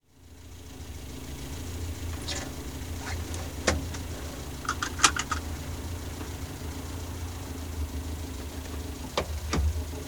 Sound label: R4 Setting Off, Renault 4